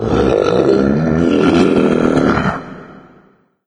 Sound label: mancubus sight; doom3